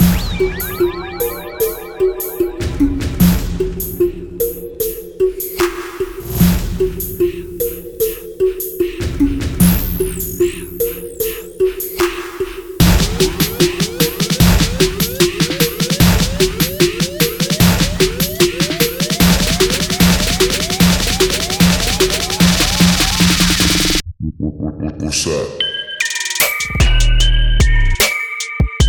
Real Trap Samples; UZ Real Trap Samples Vol. 1

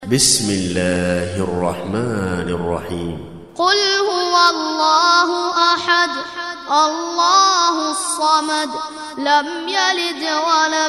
quran for kids Ekhlas

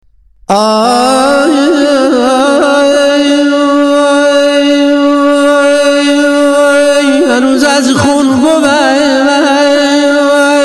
music; dashti

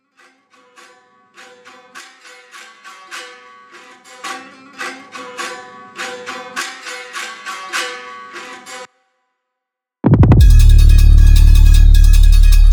Trap

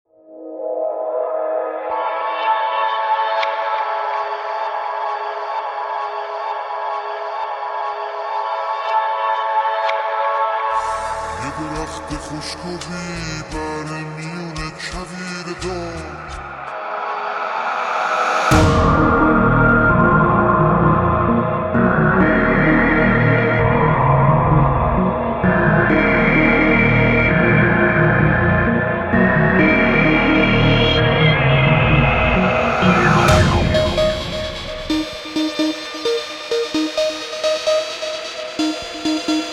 Trap

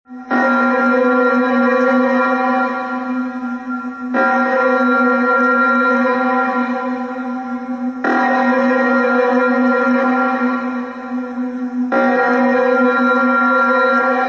alarm

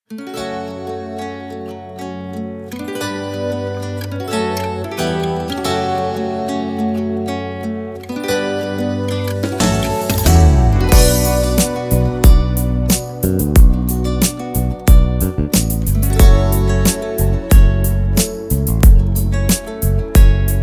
Emo Band

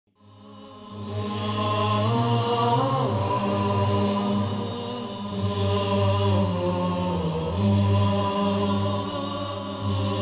relig melody

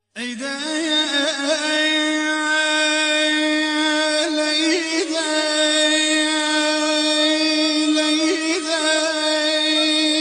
music; dashti

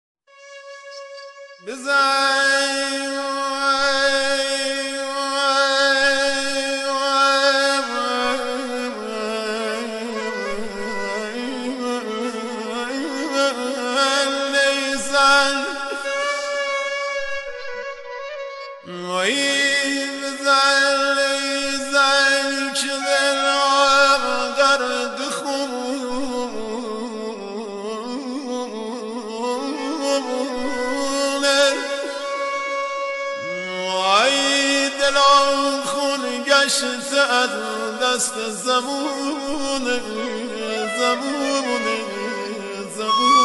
music; dashti

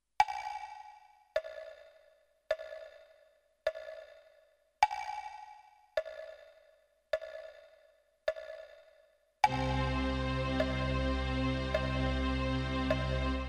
1st 2nd Intervals

